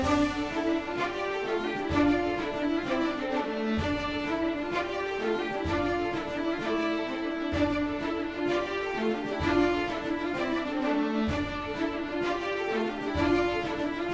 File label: Music Fight Pirates Theme Easy Bpm128 Loop; asterix obelix slap them all 2